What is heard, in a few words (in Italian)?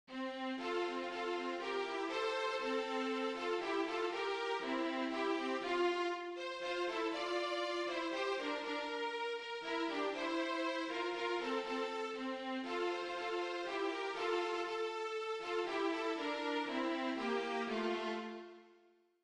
violino